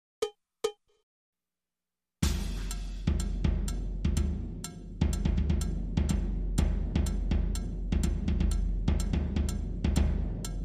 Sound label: Marching Band Loop